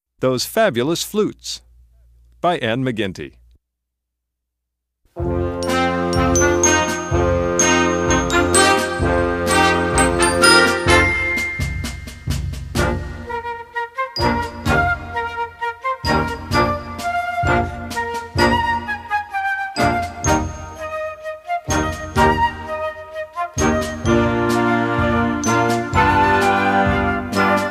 flutes

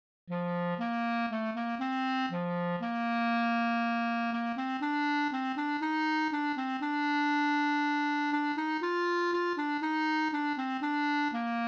clarinet caprice

